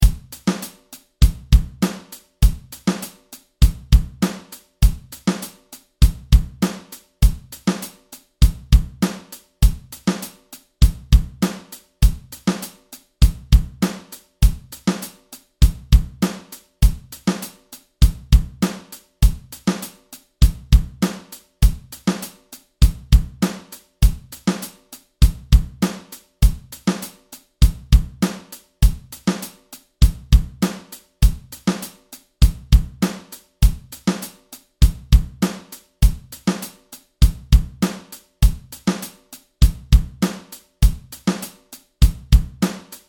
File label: 16th note drum groove